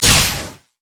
lightning rush; LoL; kennen

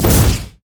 baleful strike

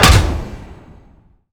ui hud level up release; LoL

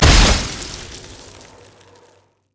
yeti ice blast; nunu

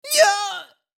dying; RumbleSkin03

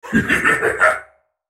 spell cast effort; Viktor; Character Voices - English; en US

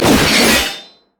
axe grab; Darius

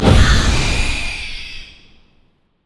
morgana q dark binding; LoL

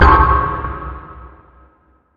sonar; grandline; nautilus

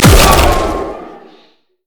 zone knockup; Zyra

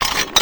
basic attack draw; LoL; varus skin03

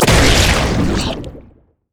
elastic slingshot; LoL; zac base

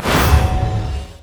feint; riven skin05

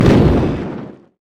desperate power hit; ryze

